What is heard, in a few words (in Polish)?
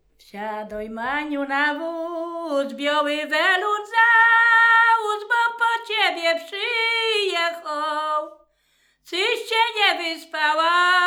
wesele wóz; Oracz